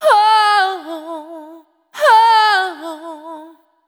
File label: Single Voice Melodic Loops; Singles